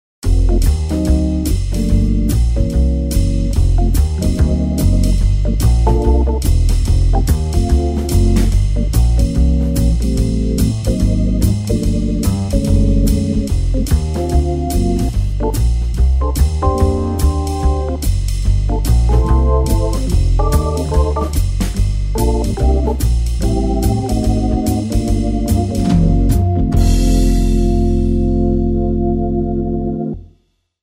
Rotary Jazz Comp

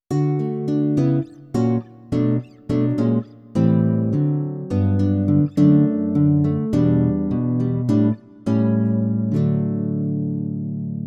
Kronos Super Stereo

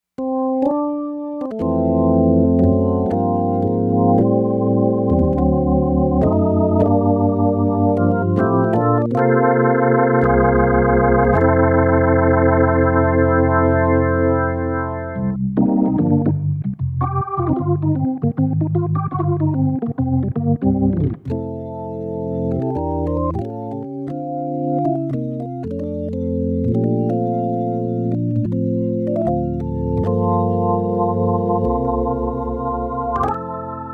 Ballad Organs

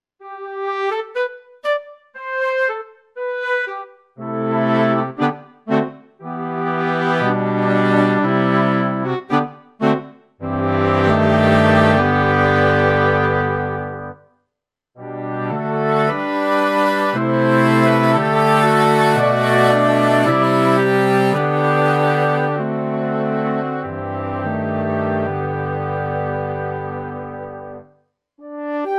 Orch Woodwinds

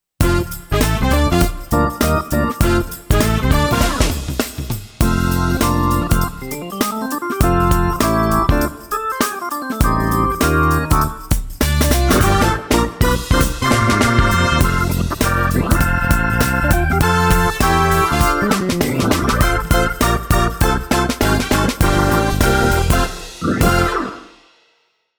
Gospel

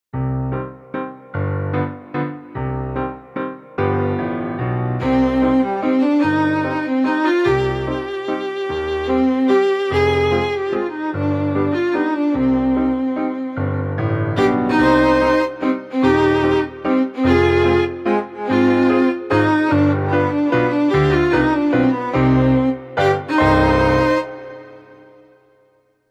Recital mix

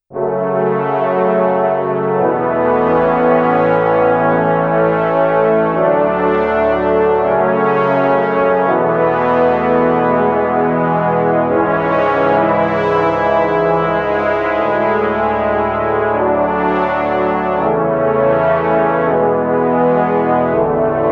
Live Worship Brass

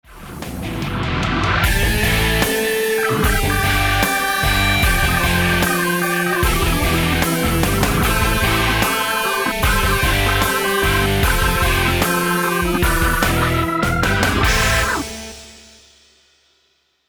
Bright OD B3 rock mix

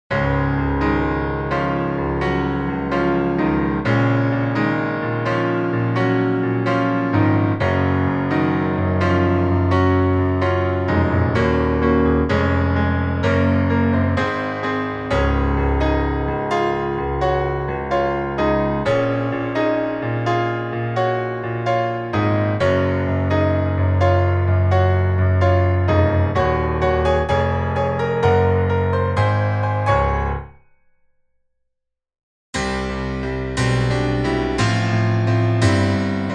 Montage Bright

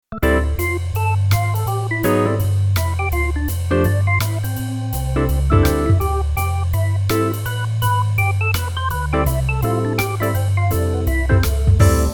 Organimation Kronos OASYS Jazz Mix

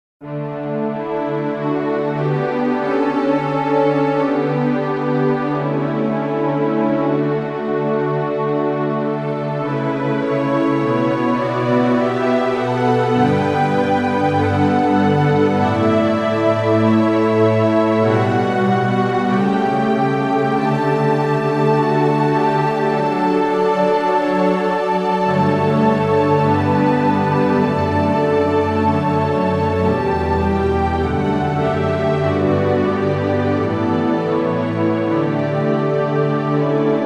String Stack Pad

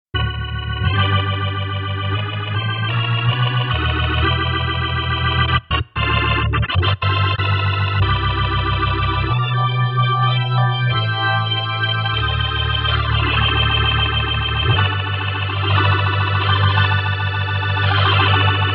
Kronos OASYS Full; Organimation

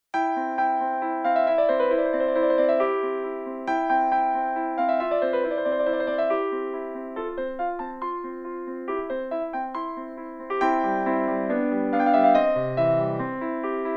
Montage Large; Mozart Son10 Movt1

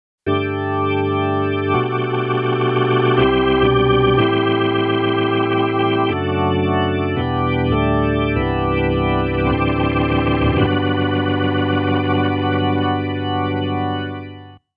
Kronos OASYS Single Rotor; Organimation

